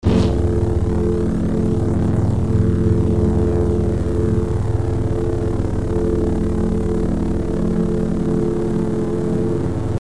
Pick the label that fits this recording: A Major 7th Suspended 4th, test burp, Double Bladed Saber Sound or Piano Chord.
Double Bladed Saber Sound